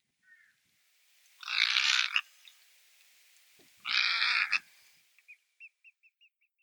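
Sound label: Pacific Reef Heron, ishigaki; クロサギ; 沖縄県石垣島; 簗川堅治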